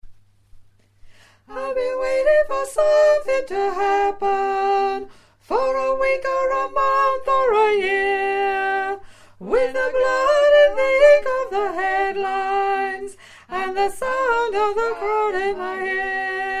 mix tenor

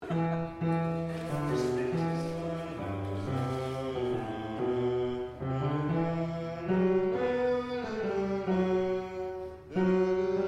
Bass

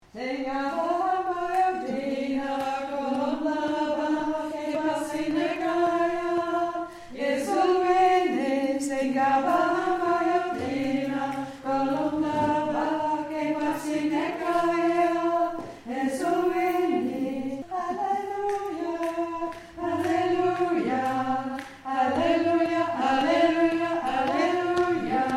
alto

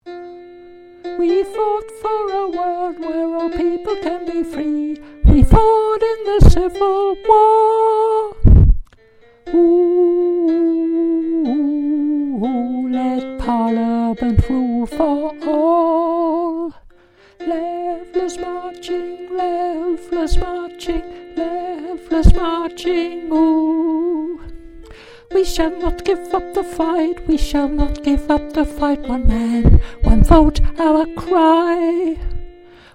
Alto

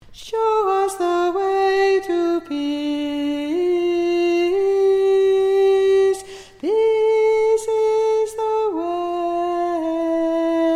Tenor

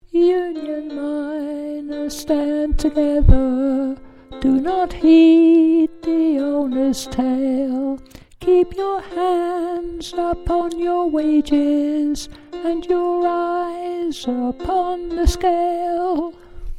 union miner Chorus Alto Falsetto

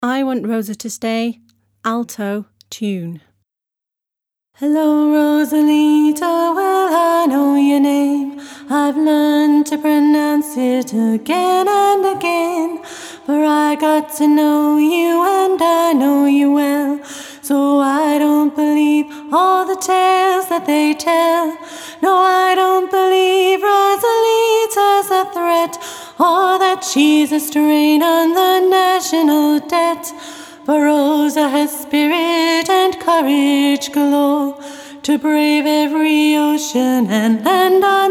i want rosa to stay alto tune

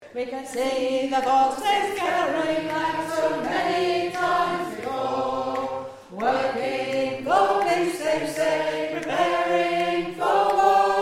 Chorus All

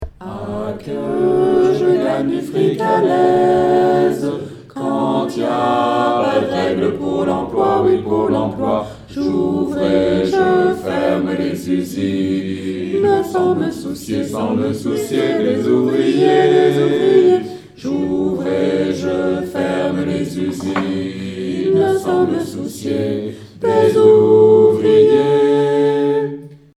tutti

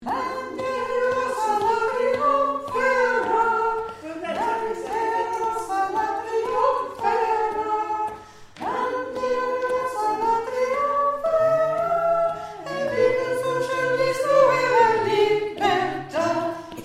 Chorus

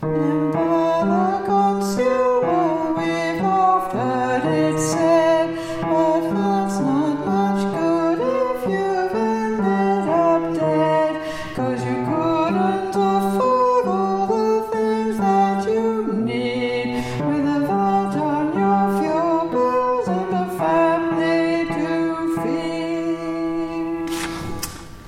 Bass; Verse 3

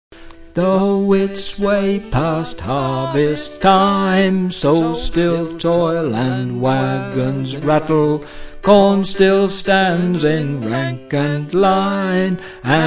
Bass, singers

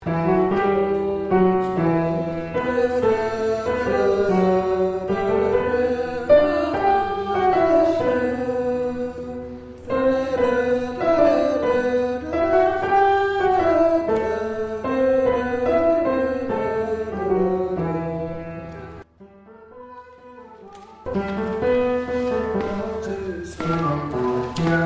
Tenor

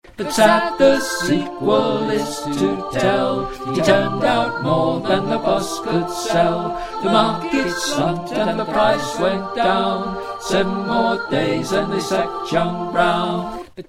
Voice All

